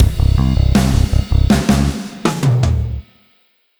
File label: Dramatic Event Prision